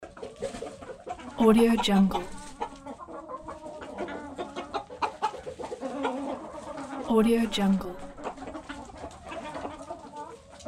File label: chickens clucking in a chicken coop